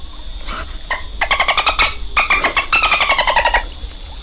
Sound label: dolphin